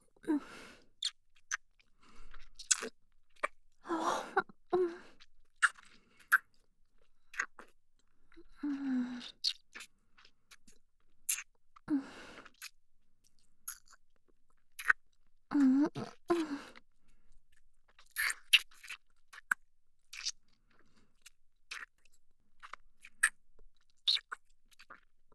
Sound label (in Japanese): ディープキス